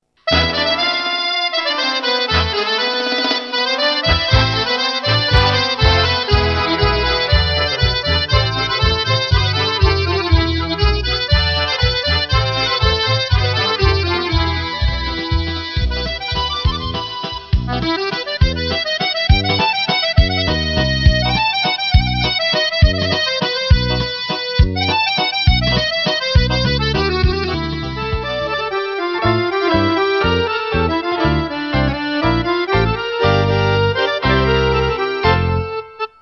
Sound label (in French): accordeon mix; Accordéon musette; enregistrés le 10 janvier 2015 à Chasselay (69)